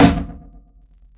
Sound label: SNARE UNDERWATER, ELECTRO KIT